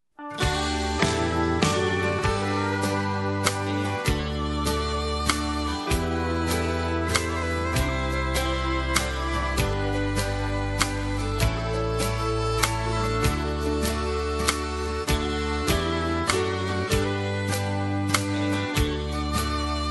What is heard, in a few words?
3/4 Country Ballad